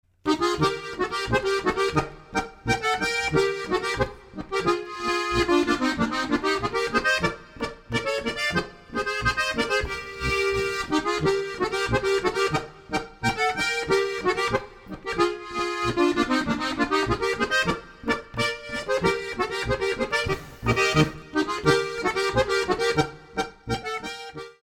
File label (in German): Boarischer